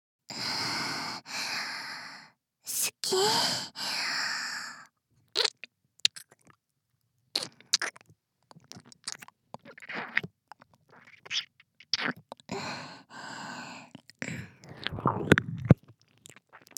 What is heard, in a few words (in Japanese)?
一舐め 右